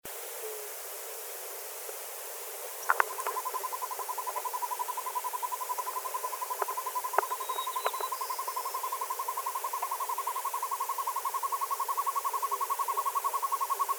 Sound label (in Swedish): Hokuggla; Northern Hawk Owl; Surnia ulula; Daniel Lane